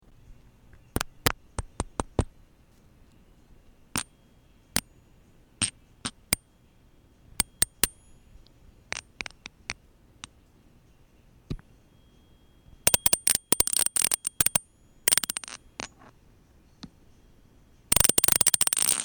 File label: tuning fork on glass